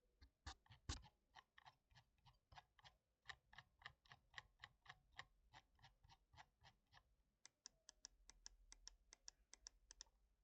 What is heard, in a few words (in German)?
Mausrad